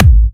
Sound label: Trance Kicks, Bassdrums